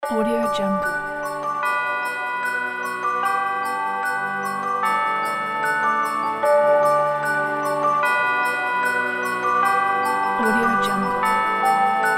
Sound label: christmas magic; okstockmusic